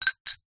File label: industrial wrench bicycle set down gently concrete ground